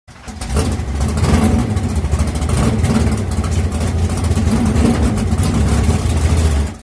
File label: Pro Stock Start, 1969 Camaro SS